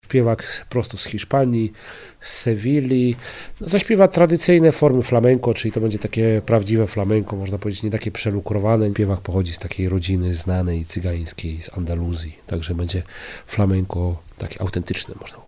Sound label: Flamenco